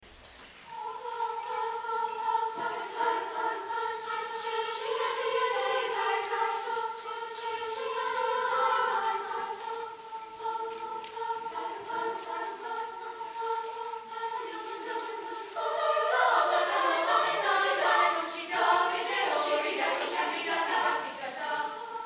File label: chamber choir, hotaru koi